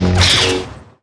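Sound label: Light Saber sabre, Contacts avec d'autres sabres; effets speciaux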